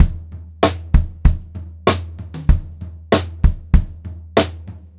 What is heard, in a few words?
Pop-Rock Drumloops; 96 POP 3